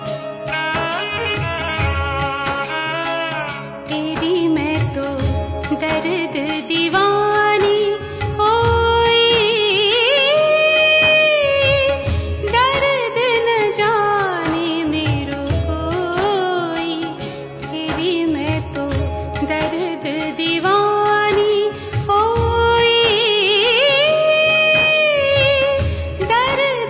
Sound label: Female; Krishna Ji